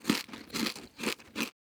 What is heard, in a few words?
eat; Rust